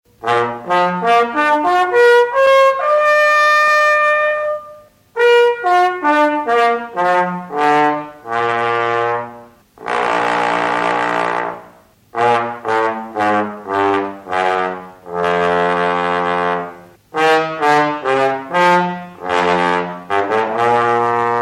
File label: trombone